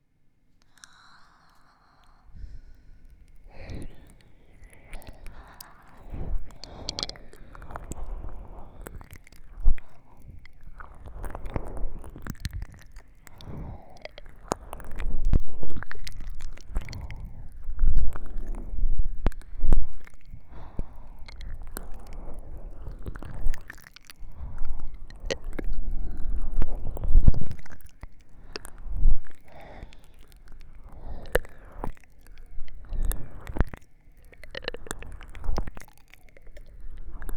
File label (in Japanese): 耳 れろれろ 弱（両耳）